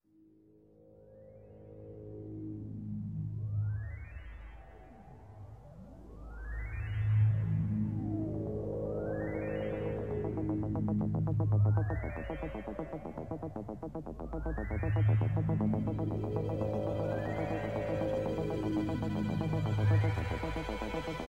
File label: Pipe line, Pan flute music